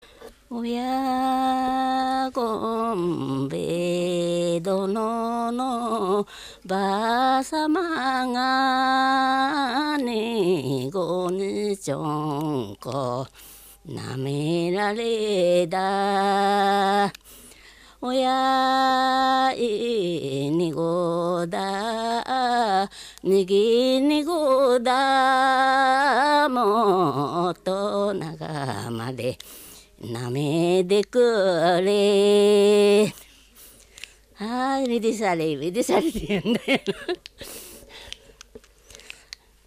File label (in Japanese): taueuta, minyou